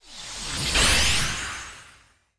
Card Image Projection

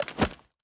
PickUp Cloth

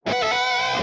Guitar Cheer

